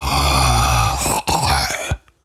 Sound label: Male Zombie; Zomboid 41.78.16; Project Zomboid